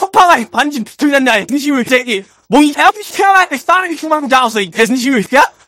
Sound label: negative vocalization; scout